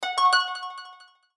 Graceful, notifications; Explay

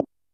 Keypress Spacebar; Meitu T8

